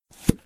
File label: thwop; Phineas And Ferb - Backyard Defense